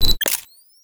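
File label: attack camera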